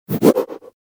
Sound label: move fluttered small